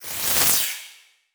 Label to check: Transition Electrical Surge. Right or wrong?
Right